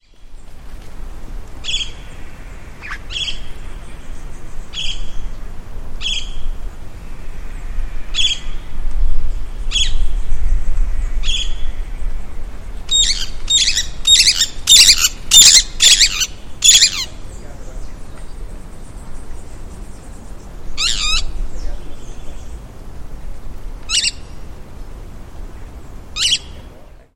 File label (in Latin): Megarynchus pitangua; JD Botanico SP; Brazil